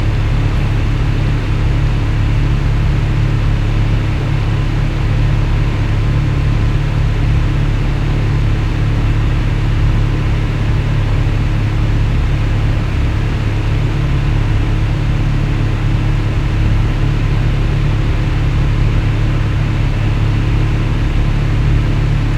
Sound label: regular, engines; DanisRace